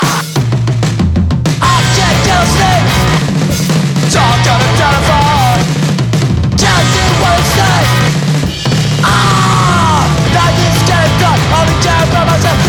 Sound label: electric guitar